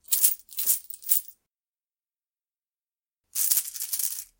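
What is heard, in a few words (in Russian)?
звенящие монеты в мешочке; zvona monet i kopeek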